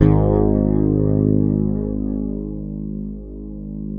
FINGERED, Bass; Sampleheads; Will Lee Bass Library

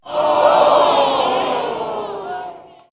Fear Disappointed Mob, vox; vox mix; X-Static Goldmine

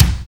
MEAN KICK; Drums In Your FACE!

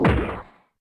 Punch, YAMAHA RM1X

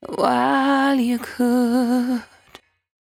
74-5bpm B, Velvet Vocal; While U Could; Zero-G

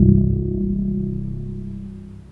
Clangorous; Misc

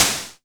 Perc Noise Short, Tama TS-206

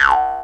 C# JAW, Primitive Instruments

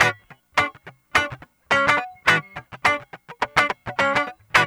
GUITAR; East West

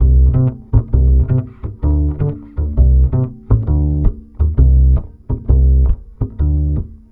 BASS, 132, Balkanish Traditions; USB Soundscan vol.63; AKAI

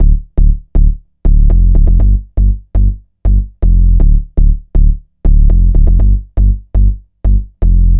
Deep Bass; Dr. Fink's Funk Factory

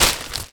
Carrier Bag, household rhythm samples Hits

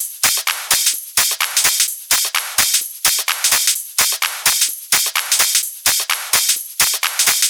Loops 128BPM, Drumloops

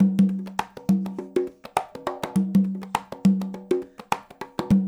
loop, congas; Percussion; InVision Interactive; Percussion Slam

